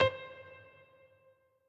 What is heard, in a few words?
piano riff intro